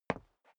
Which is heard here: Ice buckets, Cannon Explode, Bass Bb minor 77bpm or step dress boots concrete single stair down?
step dress boots concrete single stair down